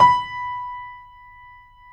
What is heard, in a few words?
JAZZ B4, Keyboards Acoustic; AKAI; USB Soundscan vol.17